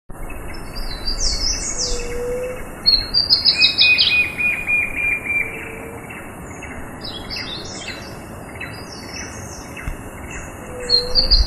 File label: Pyriglena leucoptera; PP Pinalito